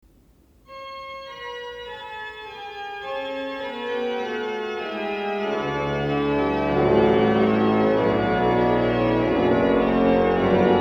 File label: CHRISTMAS CARILLON, organ